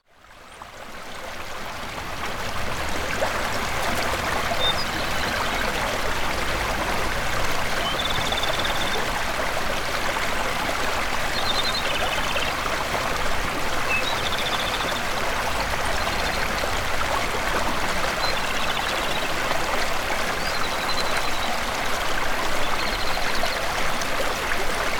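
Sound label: komadori, Japanese robin; bird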